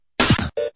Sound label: 2 beat break, fills and rolls